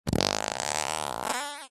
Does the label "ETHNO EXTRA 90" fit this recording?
No